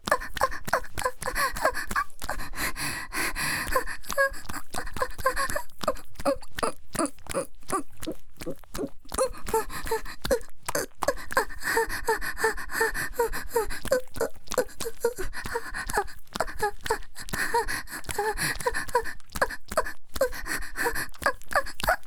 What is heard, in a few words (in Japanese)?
ギシアン ループ; 無感情ダウナーヤンデレ少女に弱みを握られて…